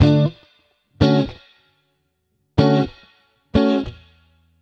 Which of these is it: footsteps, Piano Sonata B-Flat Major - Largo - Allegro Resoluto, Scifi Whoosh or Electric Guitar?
Electric Guitar